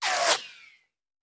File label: Bullet Pass By; Sound Ideas; Guns